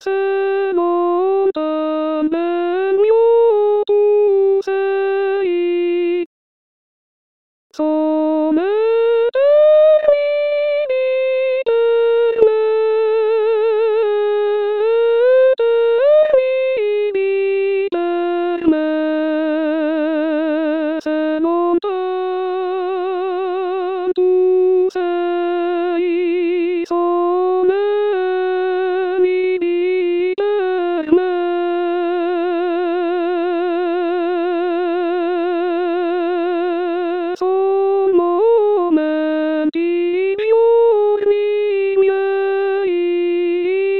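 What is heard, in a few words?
soprano; Mozart; Se lontan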